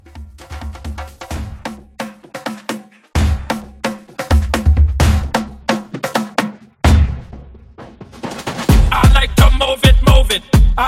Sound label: Club Hype 130 CLEAN